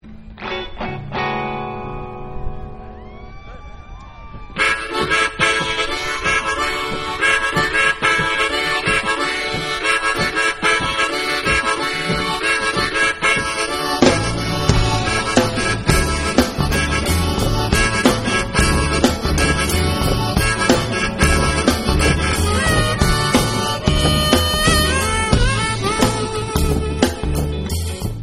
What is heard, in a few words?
glove jazz